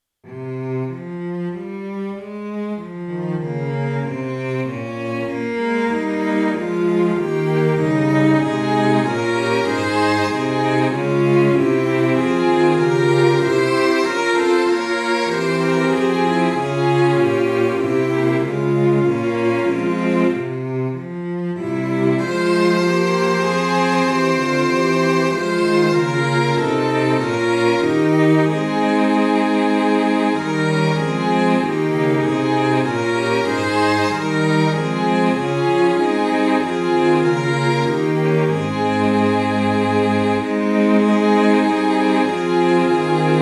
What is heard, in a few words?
Str, Tonality：f (♭♭♭♭) Tempo：Quarter note = 96